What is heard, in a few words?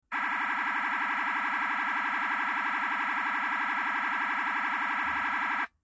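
Piercer, emergency vehicle safety warning; SUBTONE; 911 Signal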